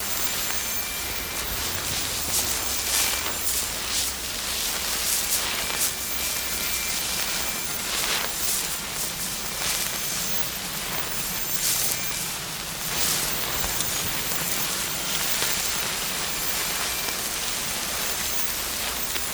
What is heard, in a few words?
Comet Stereo Loop; Journey